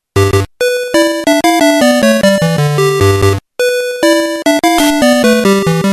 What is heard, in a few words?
X1; ElevaterAction